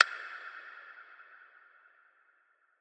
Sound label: Perc